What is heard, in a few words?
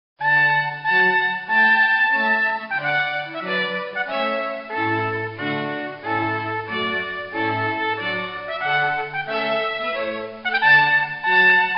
trump tune